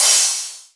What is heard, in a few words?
Hats Real Open